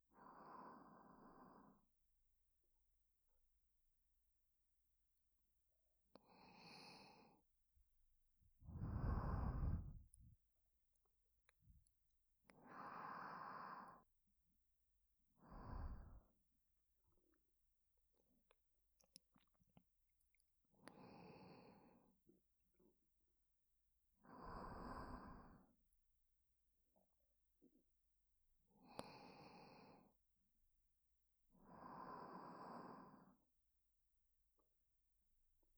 寝息ループ; SE無し

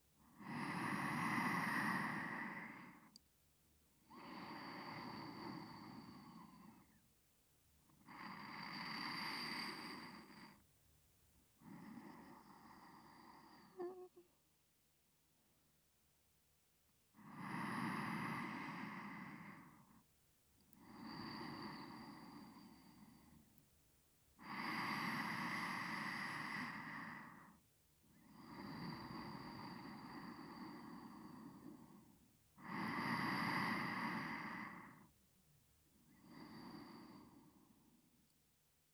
特典トラック 寝息(左側)

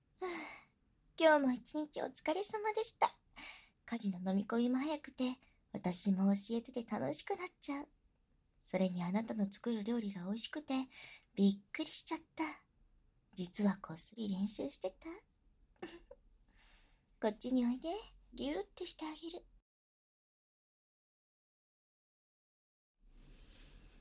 逆フェラおねだりオナニー耳舐めカウントダウン射精

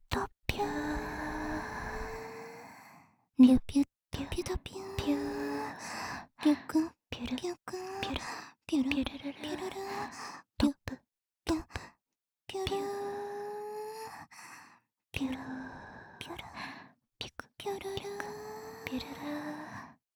ループ対応ぴゅっぴゅ音声

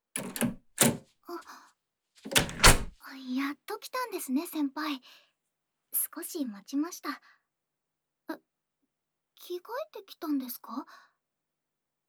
癒やし 耳かき・左; クール禁止

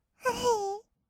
"あはっ", kawaii voice; 1200 kawaii voice!!

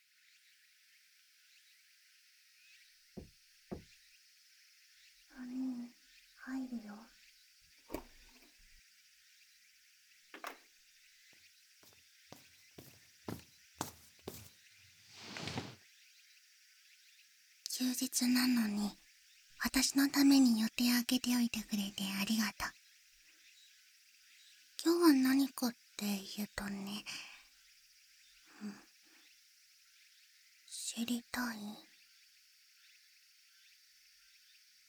吐息禁断耳舐め, 環境音あり; あのね...

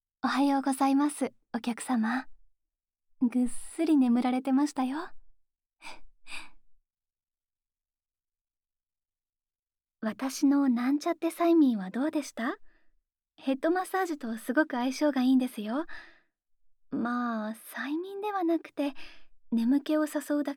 耳かき・耳吹きASMR; お耳のお掃除、始めていきます

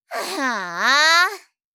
ため息(大); ASMR; 相づち・反応系【ネガティブ】

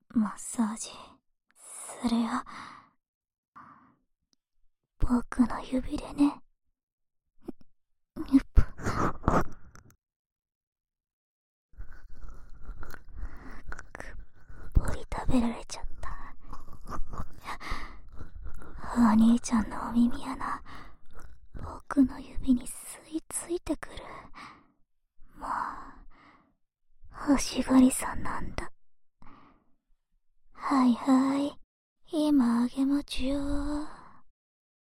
【左】指耳かき, ASMR; 耳かき小分けトラック

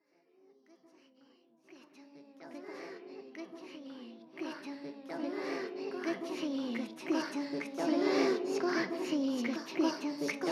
セリフなし, しこしこ; 魔法少女マスカリマッスル; エターナル魔法しこしこトレーニング

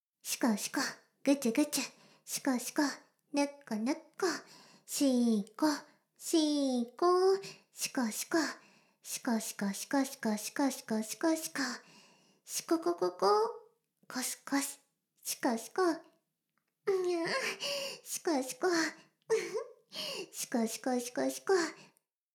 シコシコ音声, ASMR; 魔法少女マスカリマッスル

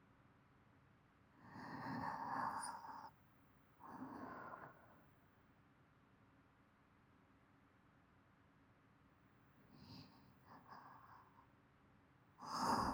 凪の寝息ループ

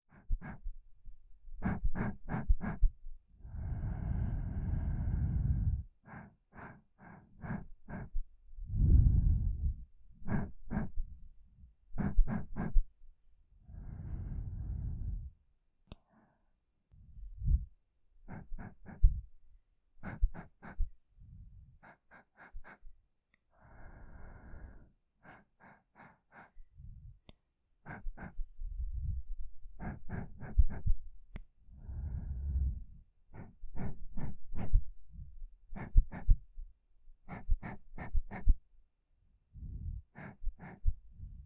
くんくん♪ 匂い嗅ぎ, ASMR

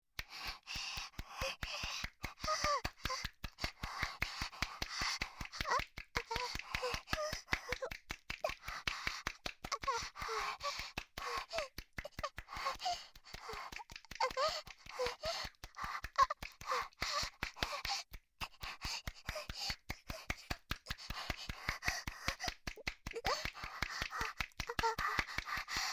過呼吸 レイプ ボイス, 女の子のエロボイス

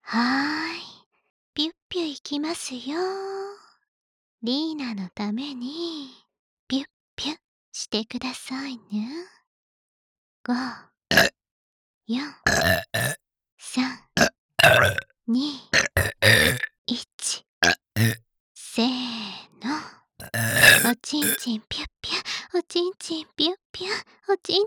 ゲップ; カウントダウン射精; リーナ

